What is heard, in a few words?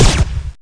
fire laser Auto; SAS: Zombie Assault 4